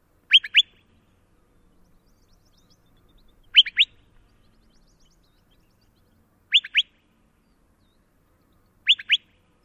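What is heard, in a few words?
Curve-billed Thrasher calls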